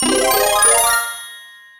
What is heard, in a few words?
Power Up Cat, Sound effect of Power-Up (Super Bell); SM3DW